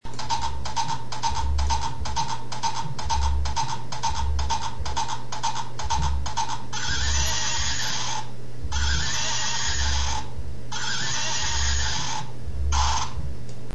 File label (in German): Trappelt und wiehert